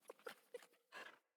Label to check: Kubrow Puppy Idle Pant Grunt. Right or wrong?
Right